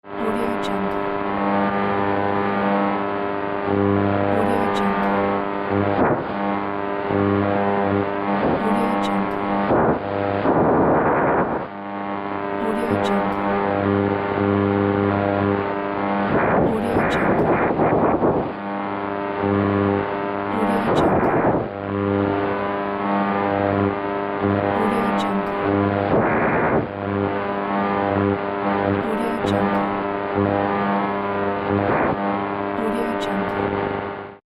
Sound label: radio wave scanning; jdproduction